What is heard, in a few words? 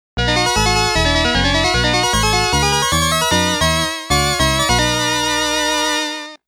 Area Clear; arcade disc in jaleco shooting